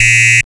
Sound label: CYBER KIT, DRUMS